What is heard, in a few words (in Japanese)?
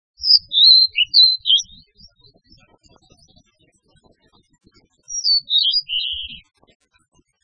ooruri